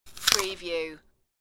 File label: Apple Bite